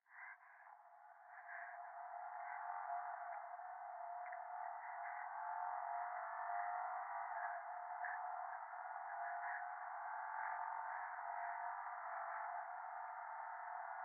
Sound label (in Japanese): 電話 右耳快感吐息 車の中